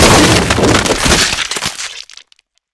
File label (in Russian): pricelnij vistrel v upor s vitekayushej zhidkostyu